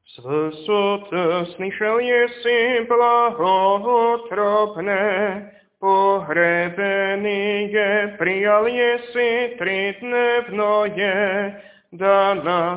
Tropár voskresný hlas 8; nedelna-skola

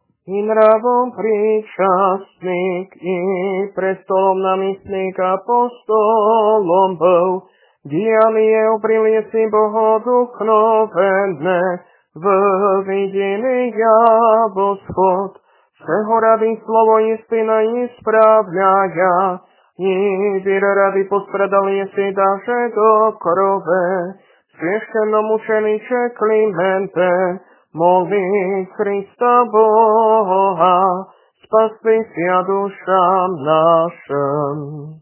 Tropár hlas; Tropár svjaščennomučeníkovi, hlas 4; nedelna-skola

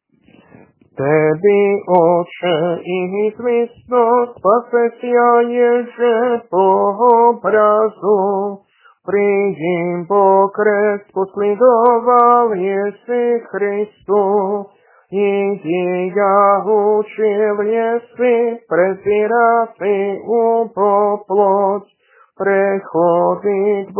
Tropár prepodobnému, hlas 8; nedelna-skola

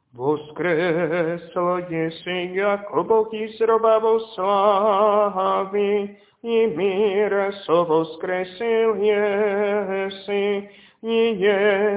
Kondák voskresný hlas; nedelna-skola

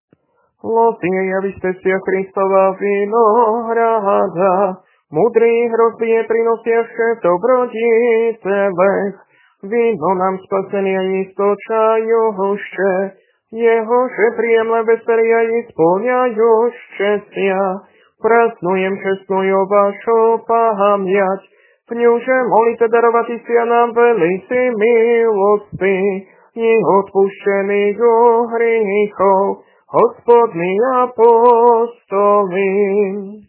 Kondák apoštolov, hlas 4; nedelna-skola

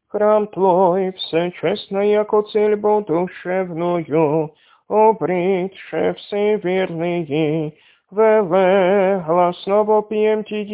Kondák mučenici hlas 2; nedelna-skola

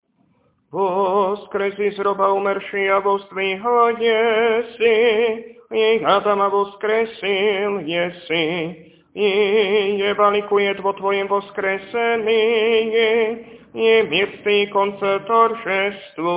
Kondák voskresný hlas 8; nedelna-skola

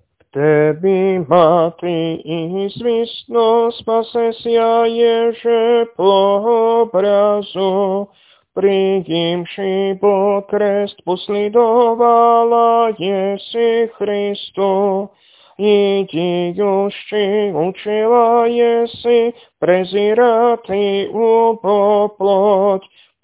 Tropár prepodobnej, hlas 8; nedelna-skola

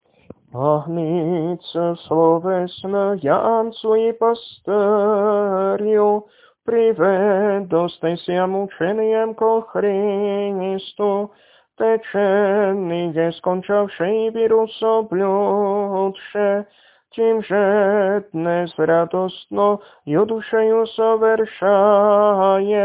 Tropár mučeníčkam hlas 1; nedelna-skola

